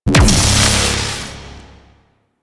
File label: Ground Laser Fire; Enemies; Abilities; Warframe; Teralyst; Bigalyst